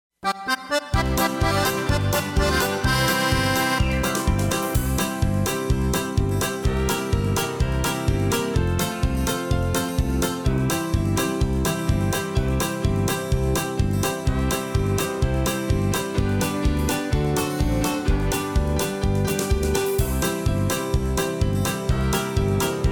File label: rhythm, square dance music